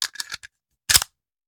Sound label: Hand Gun Foley; Sound Ideas; Guns